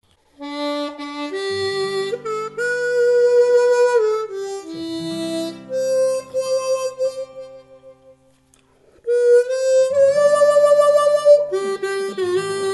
Home On The Range Slow Play With Guitar, Harmonica